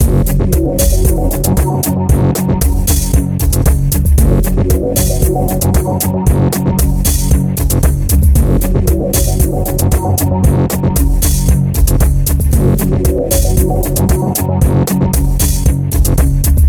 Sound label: Credit Music looping; The Fast and the Furious Super Bikes arcade gamerip 2006